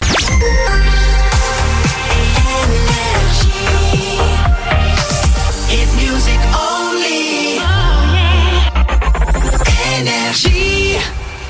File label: Jingles; NRJ Shotgun ID (2025)